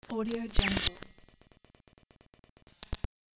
the glitch

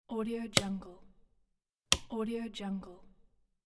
turn on off speakers; dropstudiomarket

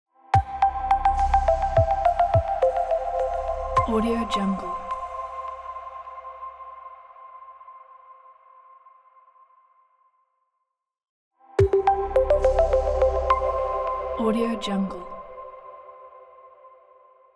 minimal logo

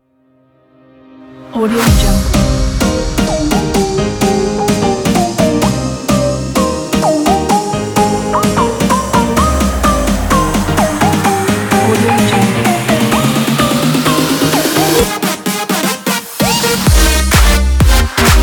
the edm

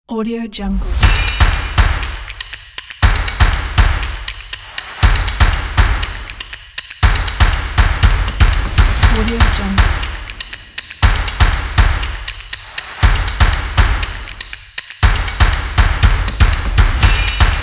corporate percussion

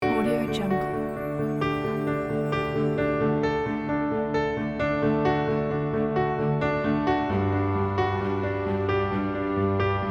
winning political campaign background

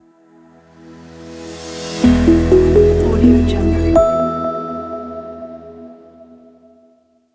elegant logo

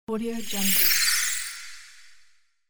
magic particles spell; floraphonic

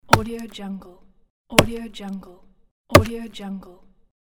axe hitting wood

